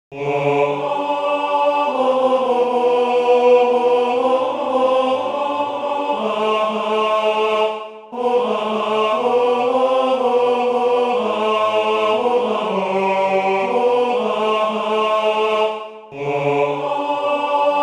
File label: tenor